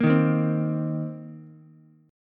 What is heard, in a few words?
C Minor 7th C Ukulele; Cm7 strummed; JGuitar